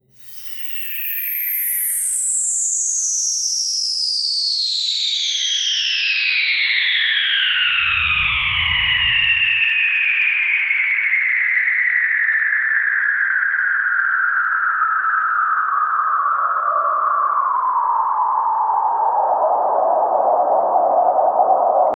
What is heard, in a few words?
a sci fi landing of a; atmospheric entry